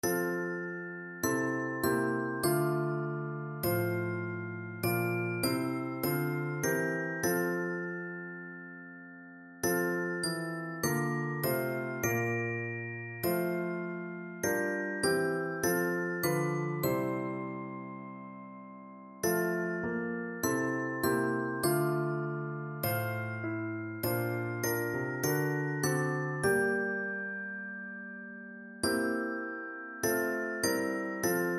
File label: abide with me, percussion